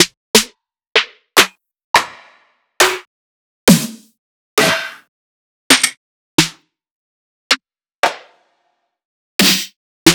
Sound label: One Shots Snare